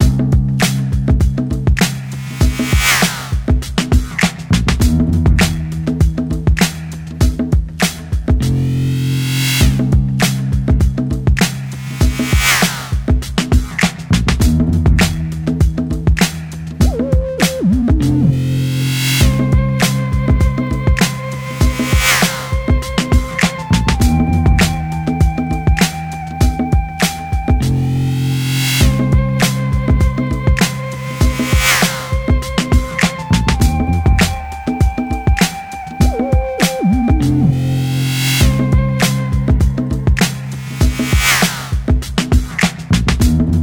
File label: ricochet, lofi